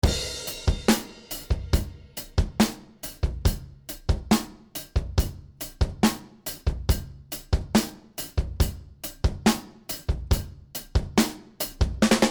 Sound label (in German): Klark Teknik 1176 KT Drums Input Overdrive ohne Kompressor